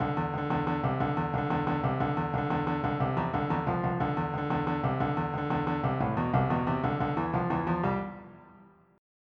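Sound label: shuffle; Software Explosion 2 - Virtual Computer Pets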